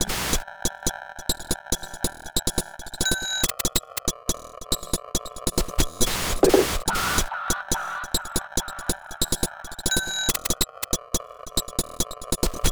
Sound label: 140 top loop Gmin; router